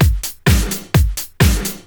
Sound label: Straight Loop 128 BPM, House